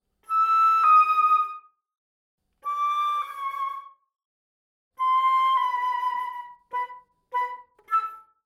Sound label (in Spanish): flauta